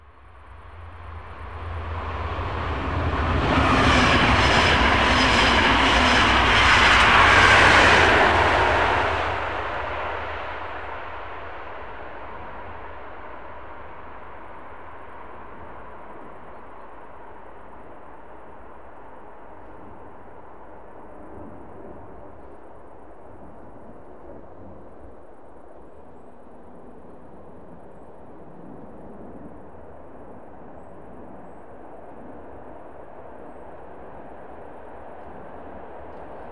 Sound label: train subway interior; best service Blue Box